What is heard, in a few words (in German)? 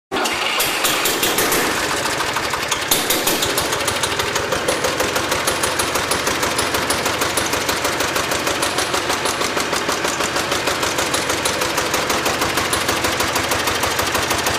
Traktor